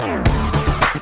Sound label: Rockin Sms